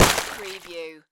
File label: Guts Splatter Blood